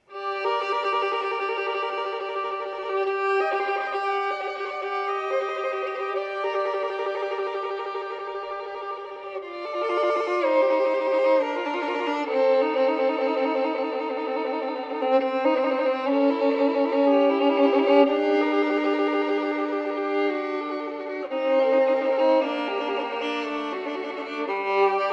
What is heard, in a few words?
caprice no. 6 in g minor the trill